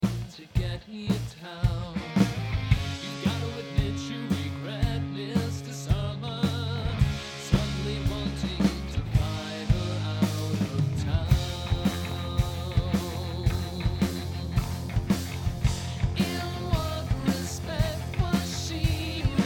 rolling bass

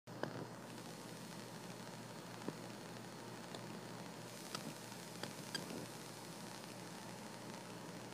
noise no programs open, Soundcard noise; Echo Layla

